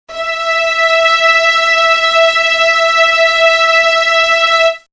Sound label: violin string 1 E5